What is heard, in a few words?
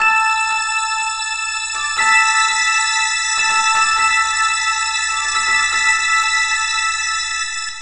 Planetismal Melodic; Sonic Foundry (Sony Creative Software); Myths of Technology